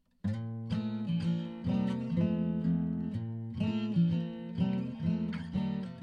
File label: acoustic guitar 50 cents up